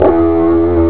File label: elec gt; jazz jackrabbit 2